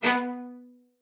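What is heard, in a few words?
CELLO spiccato B3; Xsample VOL-5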